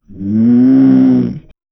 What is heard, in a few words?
a low weary moan from, misery